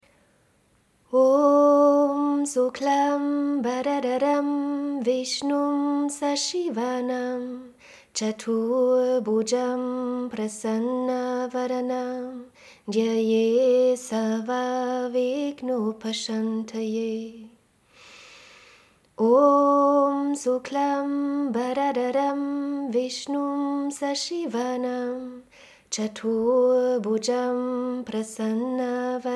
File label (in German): Mantra; Ganesh